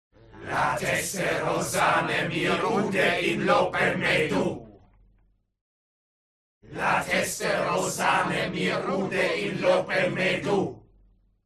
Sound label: CROWD Evoke Evil; HUMAN; smartsound